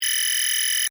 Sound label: Beep Button Chime, Scifi, Beep, Button, Chime, Science, Fiction; Tech; BlastWave